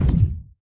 Hand Paddle Attack Hard

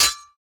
Rapier

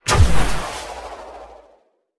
Ball Cannon Fire; Deathrun

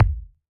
Ball Collision Geo Tackleball Med

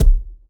Ball Player Hand Hit Tackleball Hard

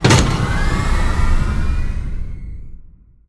Spinning Arm Speedup; Scraprun; Deathrun

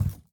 Hand Paddle Attack Extra Light

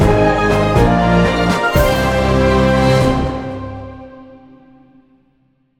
Music Quest Success

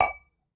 Ball Collision Holiday Mug Soft

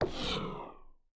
Player Quit Confirm

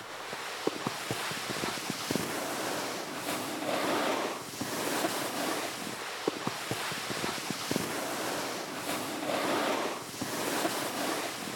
Player Sliding Layer Snow

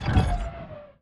Player Conveyer Release

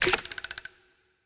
Initial Buildup; IntegrityTool

